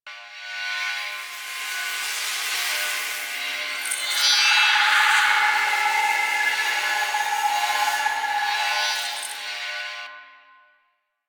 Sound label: Ambient Power; Warframe; Corpus; Corpus Lich; Ephemera